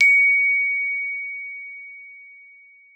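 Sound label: glockenspiel C#4; Xsample VOL-4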